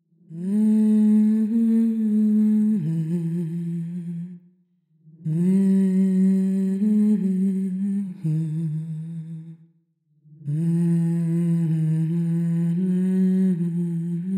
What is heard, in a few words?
ghostly humming